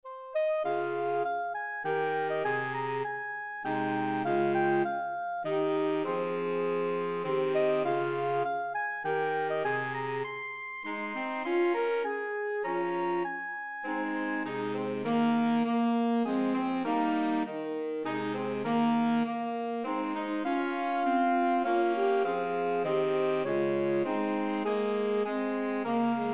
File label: saxophone quartet